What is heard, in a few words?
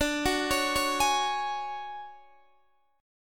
D Major Sharp 11th D Guitar Open D, chord